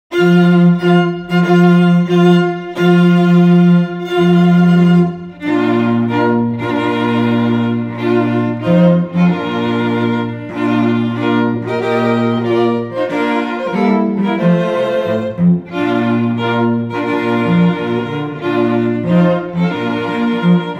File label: Wedding March